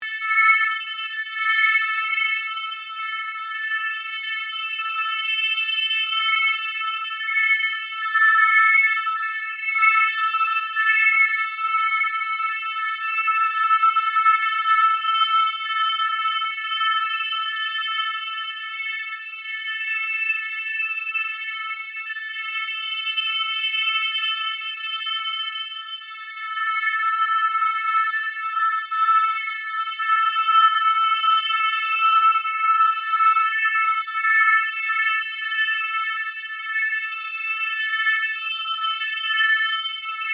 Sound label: Ambient Drone Ghost Chatter Electric; Sound effects created by manipulating other sounds; Scary Soundscape; SoundEffects-Foundation1